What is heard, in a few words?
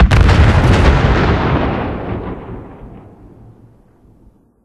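Explosion Car Nuke Distant; War Ambience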